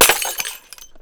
Glass, Bottle, Break, Smash, Messy; Shards Broken Glass; Chris Skyes

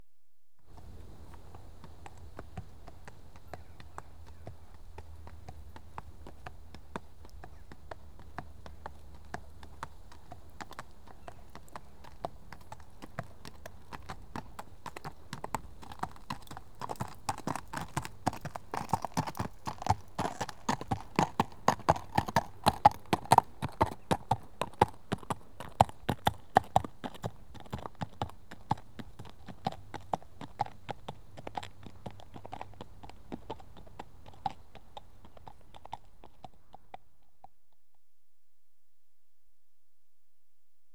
One horse walks past on tarmac; Horses; BBC Sound Effects Library

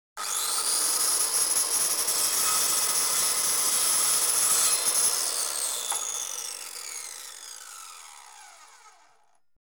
Electric Circular Saw; Household; BBC Sound Effects Library

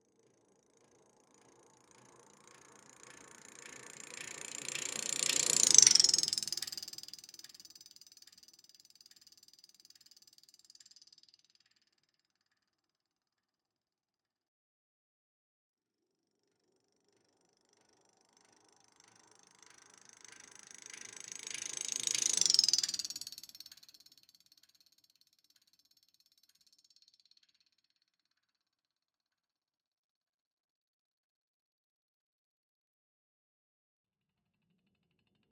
maracas doppler; Chupapsound; DOPPLER FX

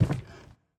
FS Wood Civilian Crouch, Footsteps; Levan Nadashvili

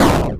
retro impact hit, Retro 8Bit Sounds; Gamemaster Audio

